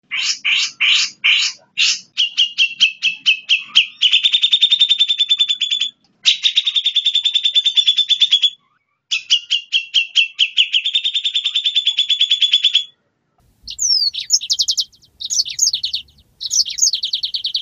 kombinasi manyar; cj moza

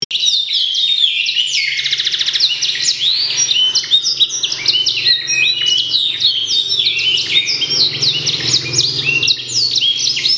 kenari green crown

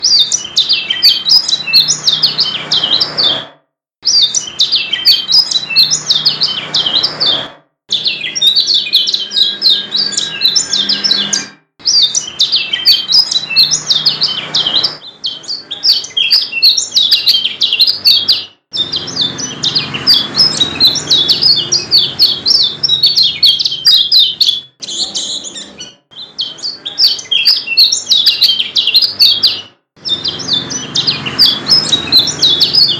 pleci lombok

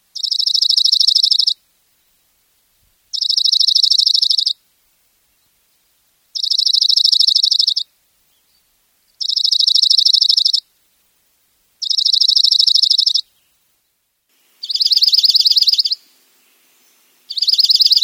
suara darkeyed junco

